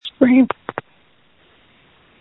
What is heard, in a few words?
Scream